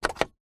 vehicles car suv nissan patrol diesel 1998 internal dash button press